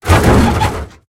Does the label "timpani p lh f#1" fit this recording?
No